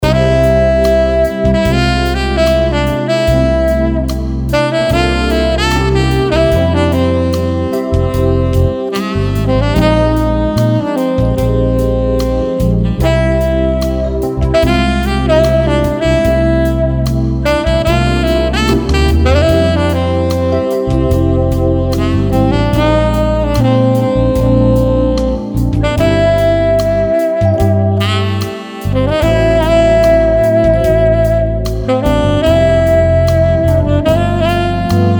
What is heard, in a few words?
sax flute